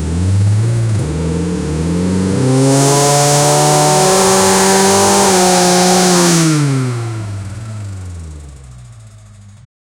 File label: acceleration 2cv flat twi